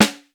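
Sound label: RIMSHOT snare drum; East West; Global Sounds; R8M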